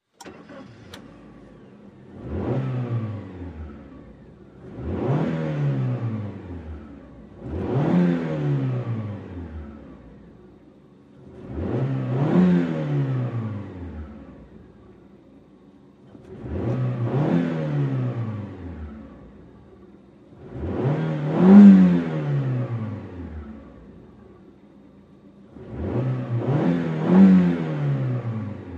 Sm Car Eng Rev Off Int, compact

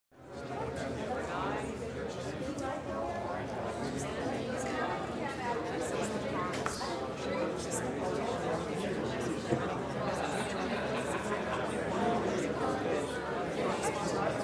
Museum Murmur, museums

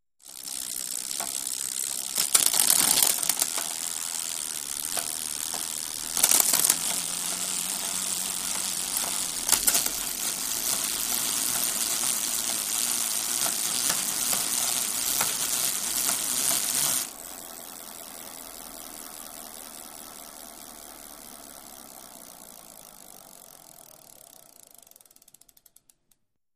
Bicycle Gear Chain

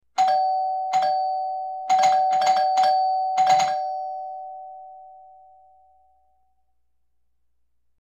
Quick Repeated Ding, doorbell

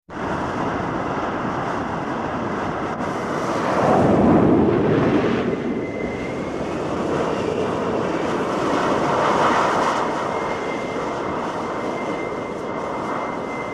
Jet Idle Takeoffs, aircraft carrier jets

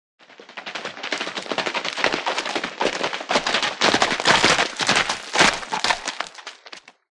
By 2 Horses Up Past

